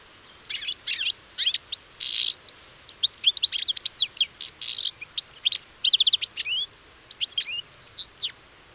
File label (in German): Stieglitz